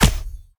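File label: element staff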